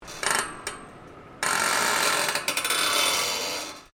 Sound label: Cables Creak Stereo, High tension cables in strong wind; Metal; Materials